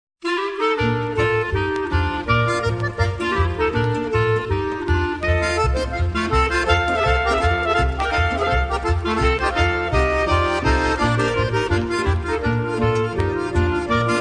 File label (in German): Galopp